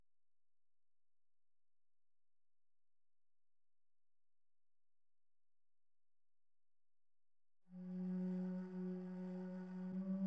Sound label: SCALES